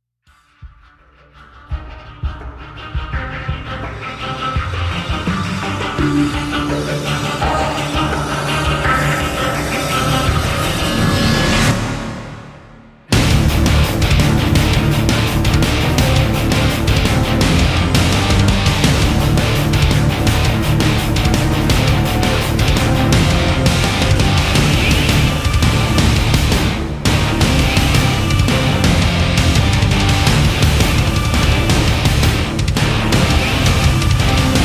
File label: Epic Speed Metal; Gothic Storm Music